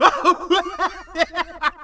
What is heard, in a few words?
Laugh; vocals ru